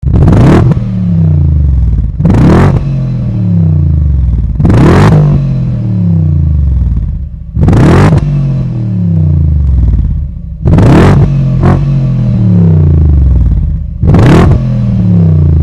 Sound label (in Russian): vyhlop bmw h5